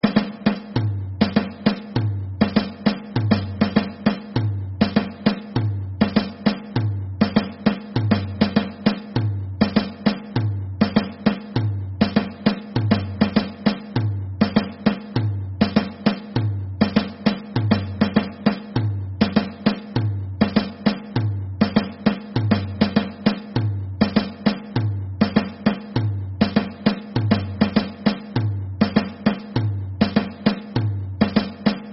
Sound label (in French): Frappe Main et mailloche